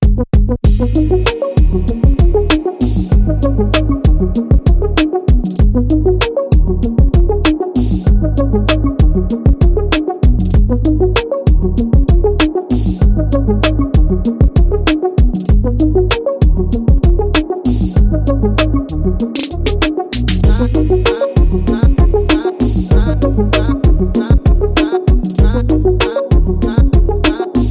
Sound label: Retro Namco